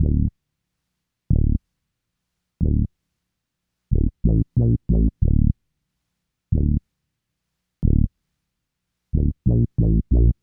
HipHop Synthbass Dmin, Hip Hop Bass Synthbass loop; Digidesign; Pro Tools Essential Loops